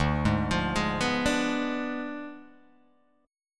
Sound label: D Diminished 7th, D, down, Guitar Open G